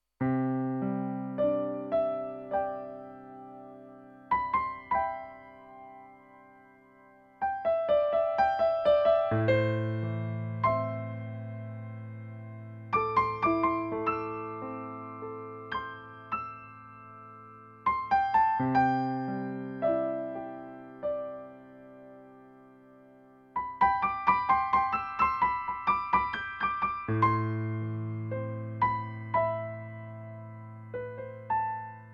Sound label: Piano